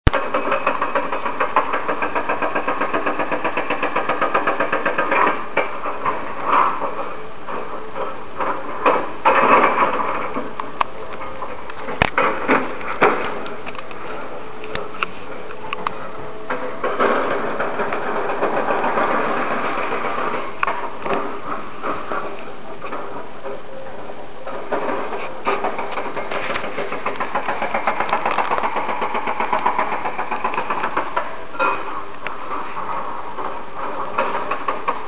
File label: ruffets noise